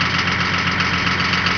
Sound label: Humvee loop; SpecOps2 MPlayer Demo